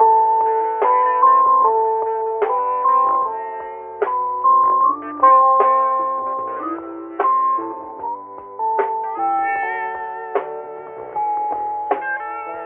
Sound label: keys